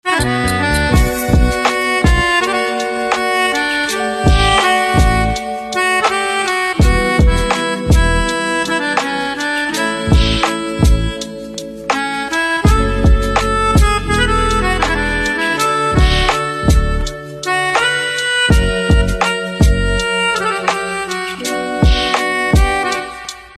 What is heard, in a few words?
Pianica Instrumental Ringtone